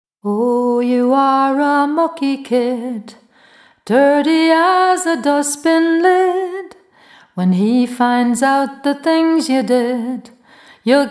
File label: Liverpool Lullaby